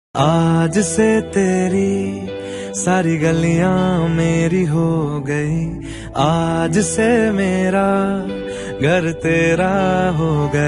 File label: Hindi Song Ringtone